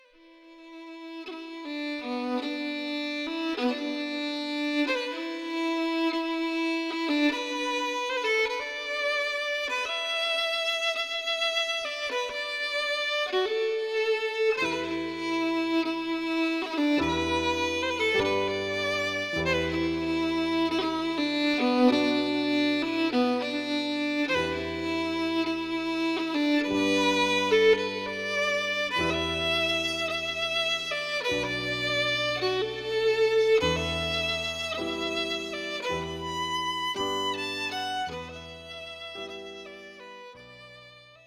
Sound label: Air Waltz